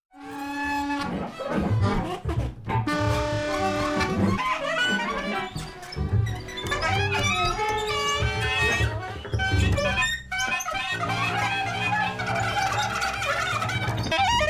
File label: shotgun